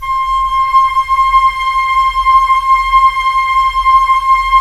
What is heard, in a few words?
FLT FL, Flute; Roland - LA Composer vol. 4